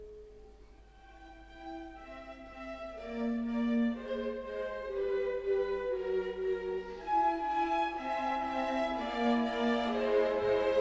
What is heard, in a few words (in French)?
Concerto pour violon et orchestre Largo